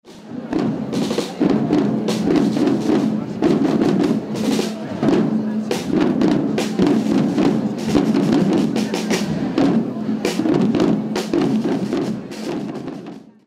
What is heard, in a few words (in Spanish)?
toque tambor; santas marías